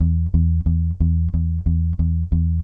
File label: Rock, Bass; Bass X